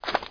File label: run desert; specops